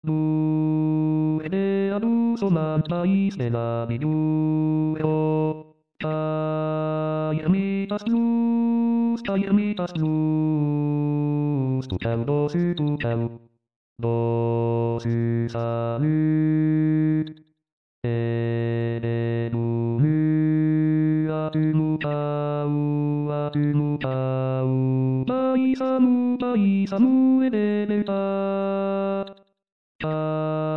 basse; Bigorre

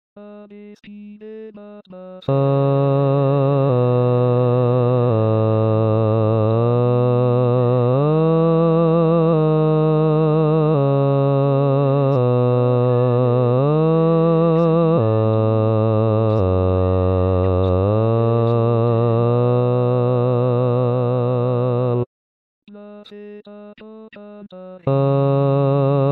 xalbadorren basse

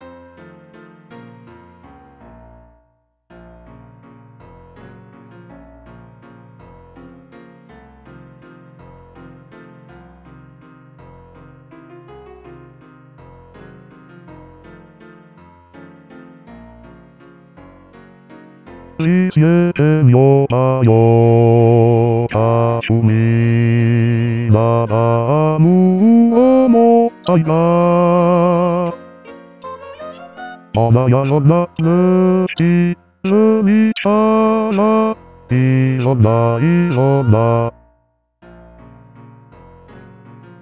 basse

